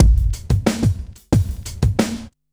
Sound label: Street Tip, R&B Drums; Sonic Foundry (Sony Creative Software)